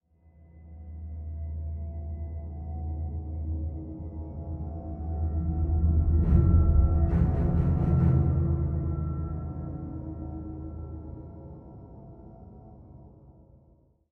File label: Idle, 85bpm; Legends of Pegasus; windows gamerip 2012